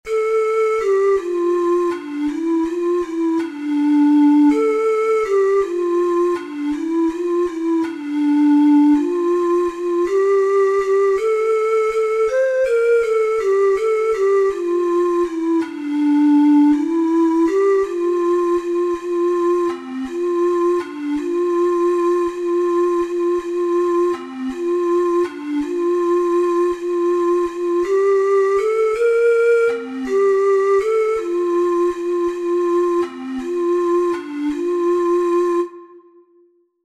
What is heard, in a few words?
ocarina four hole